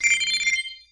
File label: vote pass; Tribes2